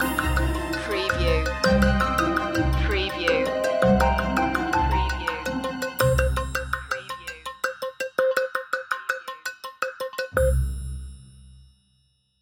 quirky creatures

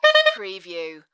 Sax 3 Quick Notes

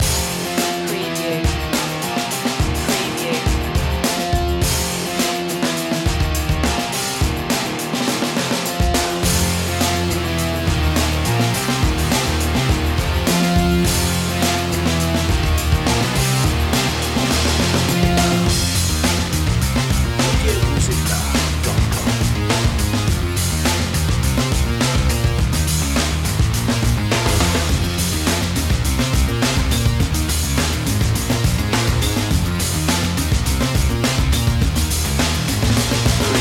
rock slam

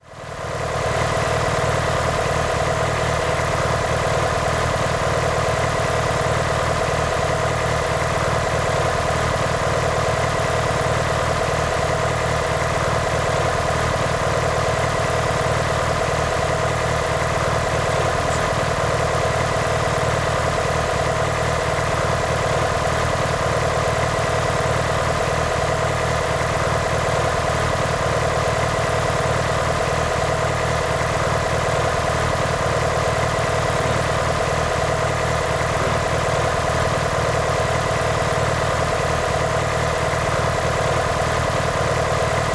MITSUBISHI EVOLOUTION ENGINE IDLE

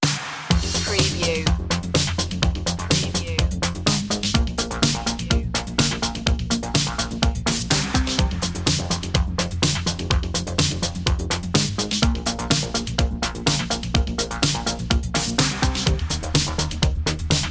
80s drum and bass

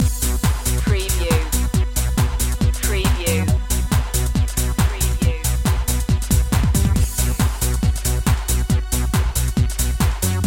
accelerator loop

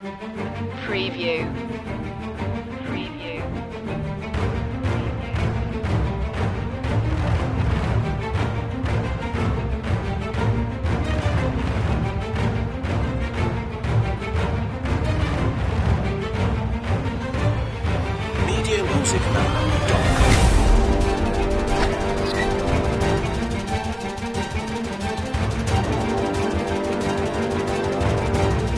pirate attack

